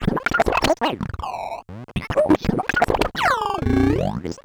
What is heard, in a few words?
Bent Talking Teacher, Circuit Bent